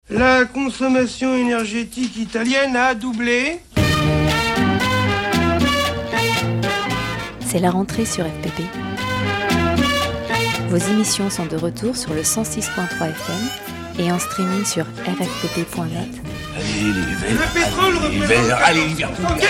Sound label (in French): radio Jingle Rentree